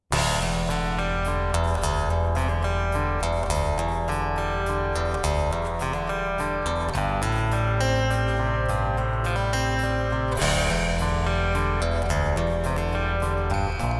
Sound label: Emotive Beautiful mostly guitar; CUES for film TV games etc; POSITIVE